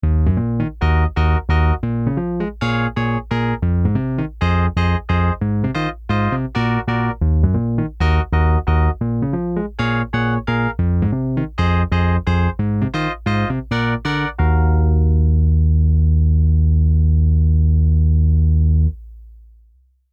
microkorg

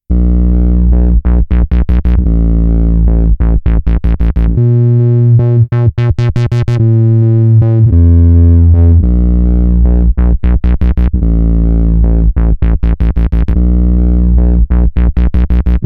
skulpt synthesiser

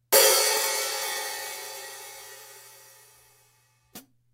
openhit

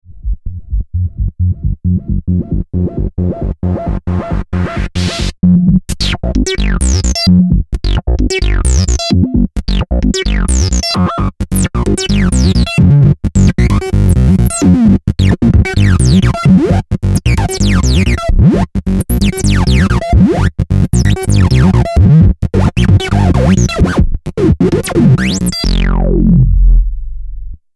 monologue

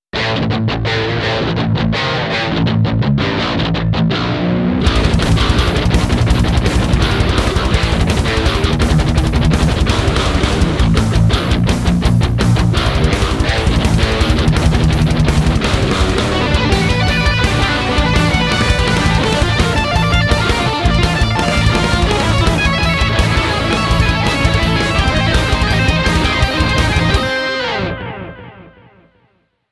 lead

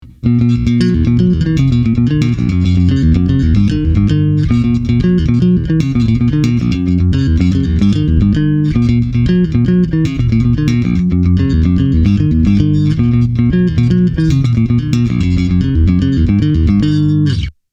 clean bass

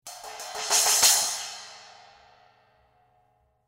buildcrash

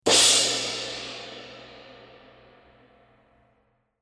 crash

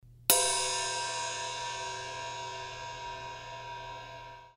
crash

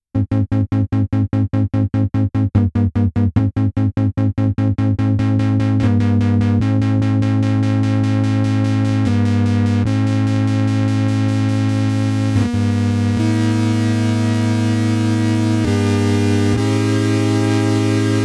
skulpt synthesiser

